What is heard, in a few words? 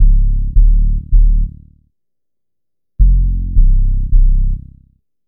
Bass, Futurist Drum & Bass